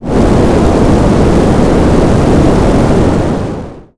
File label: fire